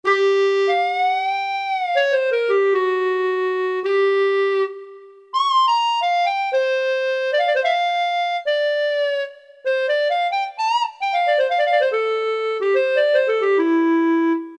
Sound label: Clarinet; Roland PN-D50-01